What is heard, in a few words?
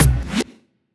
Percussions